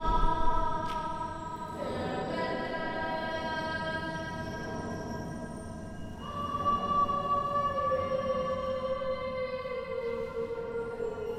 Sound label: Young Girl Singing Alleluia